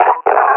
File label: pain, combine officer; Half Life Alyx